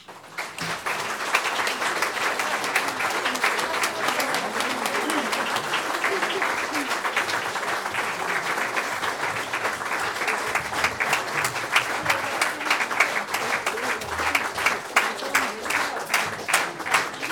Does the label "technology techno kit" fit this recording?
No